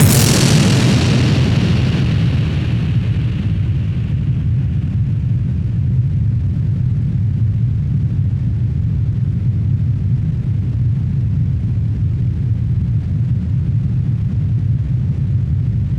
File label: Explosion